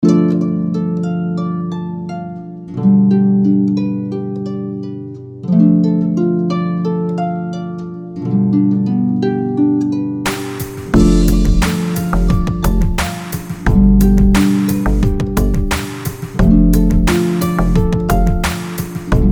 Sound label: orchestral harp 88